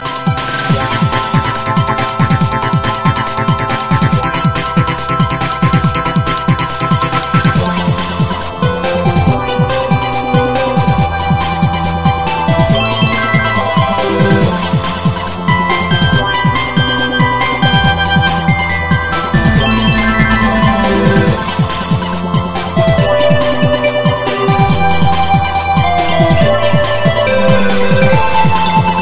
techno; donkey kong

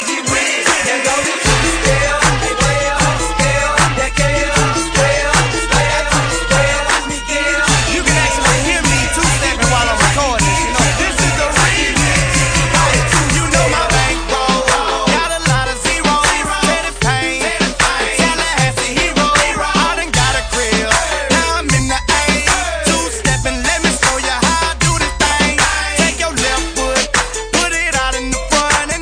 2 step remix

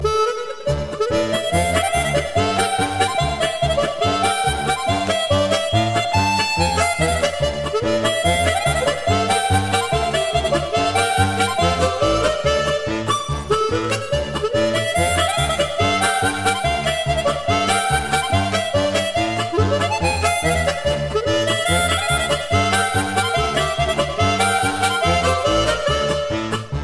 classical

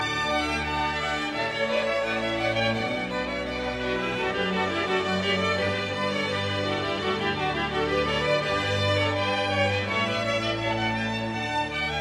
pachelbel canon in d major

